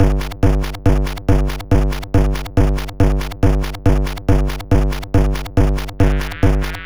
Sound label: Drums, 140 BPM; CHIPSHOP DELUXE; CHIPSHOP LOOPS